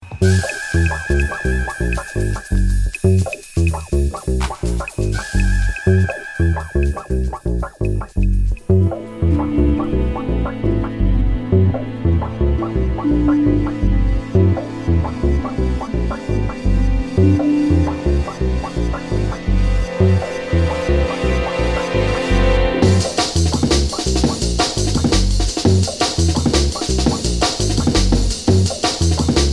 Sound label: Breakbeat